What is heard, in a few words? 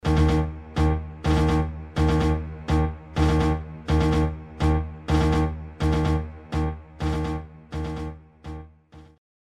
Breaking News